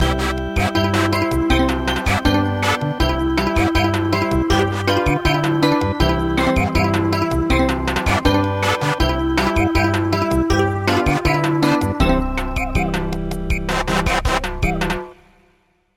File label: Samba